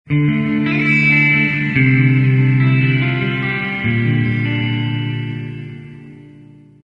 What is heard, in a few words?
accent gentle opening